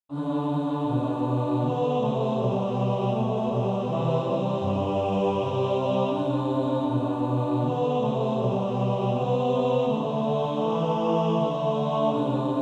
Male Choir

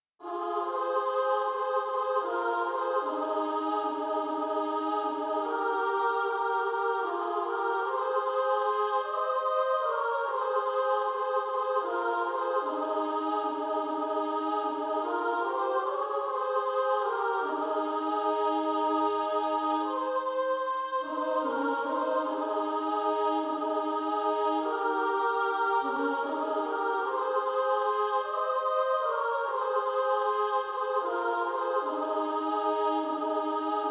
Female Choir SA

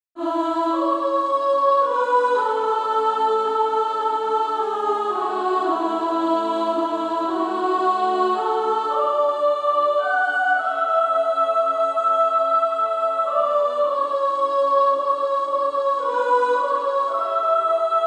Female Choir; Christ Liveth in Me; S1

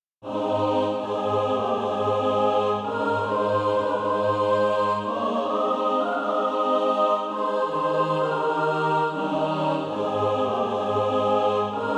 mixed

